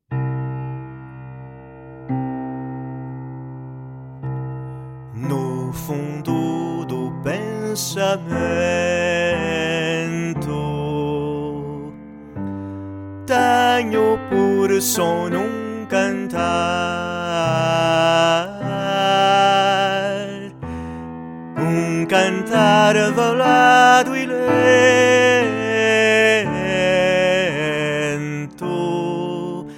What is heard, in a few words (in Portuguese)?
Um cantar velado e lento